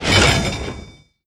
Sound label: grab; tiny; prestige